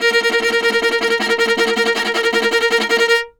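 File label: viola tremolo A#3; Orchestral; DirectWave; Varazdin Orchestral; Viola II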